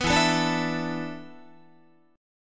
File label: E 7th Suspended 4th, Guitar; E7sus4; strummed; 14,12,12,12,13,15